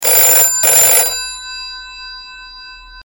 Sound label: Higher Tone UK Phone